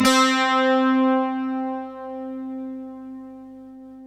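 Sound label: slow strings guitar; Optical Media International - Sonic Images Library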